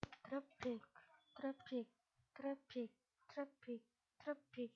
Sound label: traffic